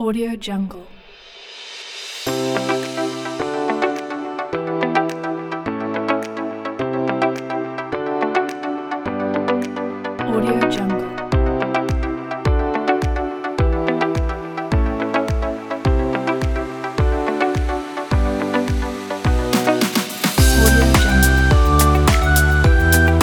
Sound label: upbeat feeling house party